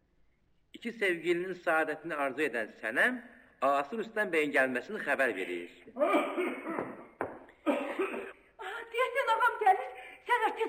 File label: duet